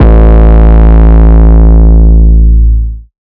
808 Bass Buzzsaw A3; Slate 808 Deep Dive; SD Signature 808s

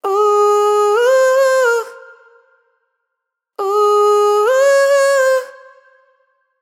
riff 135 Fm wet, Vocal; Slate Digital Omnivox Vocal Sample Pack

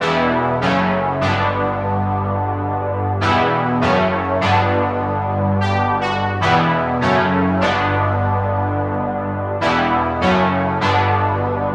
songstarter 150 Bm; Slate; SD PASADENA Pop Sample Pack

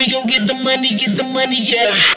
phrase, Vocal; Slate Digital Omnivox Vocal Sample Pack

